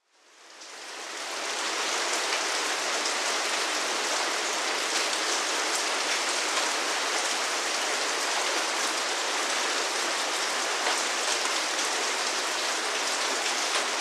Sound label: Rain